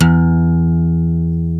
GUITAR FLUTE

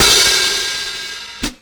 Open Hi-Hat; KJ Sawka; Drum n Bass & Beyond; Drum Tool Box

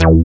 BASS, Tekno Industrial; East West

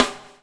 BUBL, BUBBLE; Spectrasonics - Backbeat

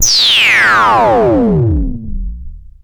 OSC FX, OSCILLATOR FX; Zero-G Ambient 1

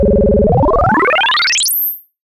Osc vs Ring Mod, vintage oscillator; radiosonics

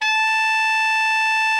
TENOR, Sax; Giga Samples Collection

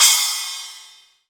ZIL 8 INCH, CRASH; MONO CRASH

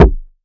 RENDER KICK

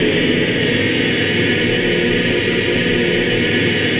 clusters, voices

